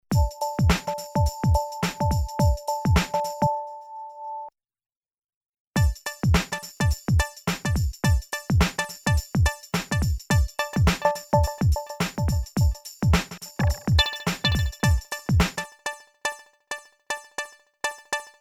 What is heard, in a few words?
yamaha dx 200